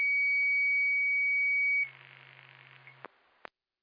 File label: Siren; Lafayette FD